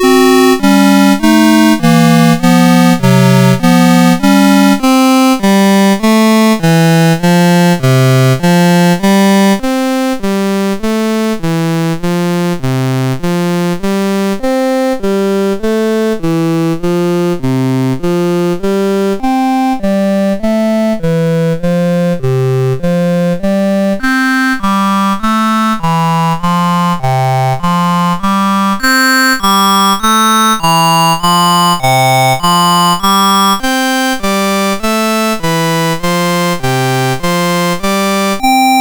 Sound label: Sync Mode Demonstration, MOS6581R2